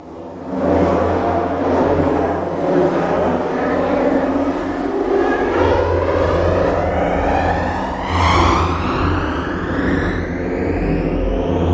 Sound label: Low Modulated Waver